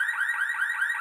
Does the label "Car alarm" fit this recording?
Yes